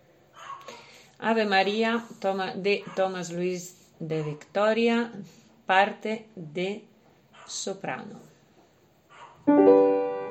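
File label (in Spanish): Ave Maria Soprano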